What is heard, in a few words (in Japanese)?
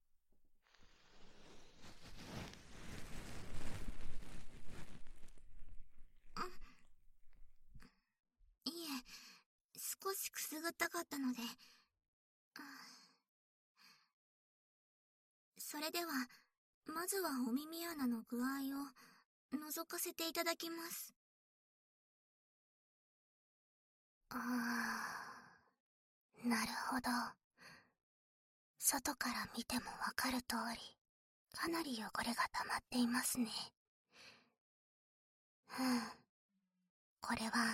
右 耳かき パート, ASMR